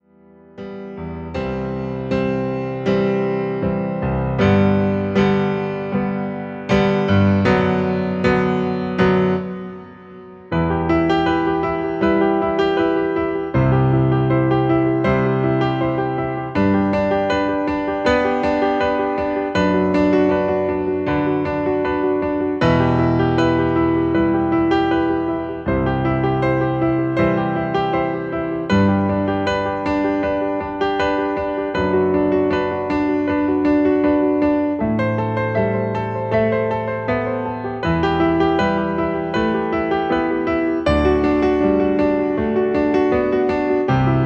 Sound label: Acoustic, Instrumental soundtrack